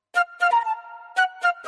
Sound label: flute notification tone